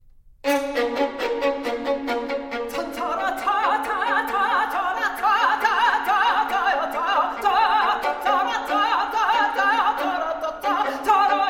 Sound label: duos for 2 violins arabian dance